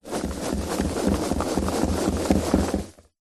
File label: office foam packing peanuts box shake